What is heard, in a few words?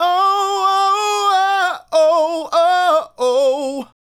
Male Solo, Hip-Hop and RnB Vocals